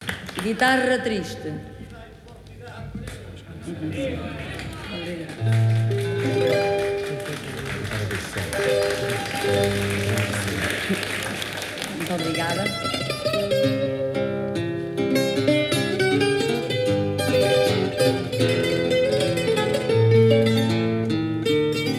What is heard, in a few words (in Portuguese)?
guitarra triste